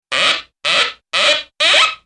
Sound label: cartoon squeek